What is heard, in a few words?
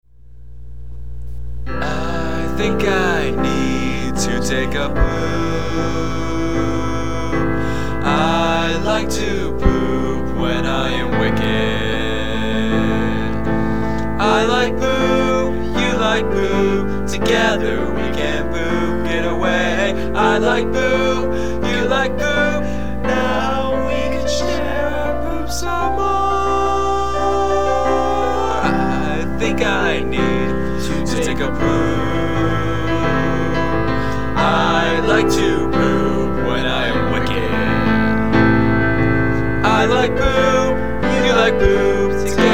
Heartfelt Piano Version; Ys